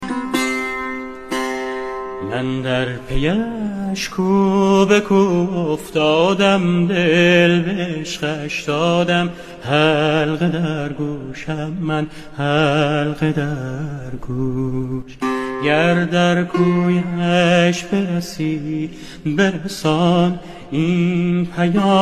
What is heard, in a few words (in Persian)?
sedaye zan